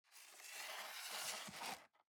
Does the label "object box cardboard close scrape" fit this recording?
Yes